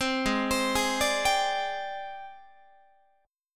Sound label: G sharp Major 7th Flat 5th Guitar; G#M7b5 Chord